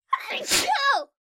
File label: cute real sneeze sound effect